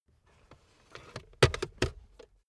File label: car backseat table pull up slow